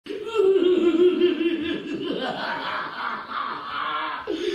evil laugh

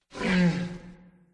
grunt

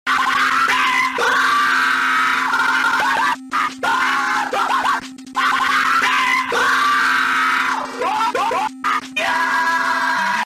ringtone

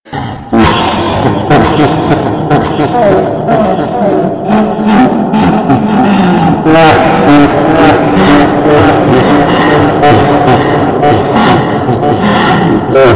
evil laugh

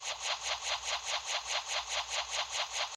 whirl

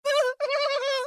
laughing; junkrat

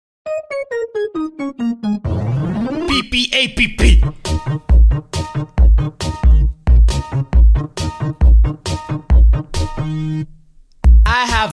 song

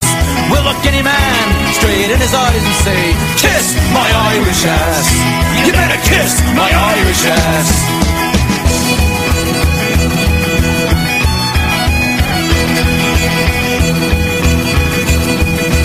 ringtone

